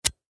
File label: Fire Zippo Lighter Flick; Flames; Objects; SFX Bible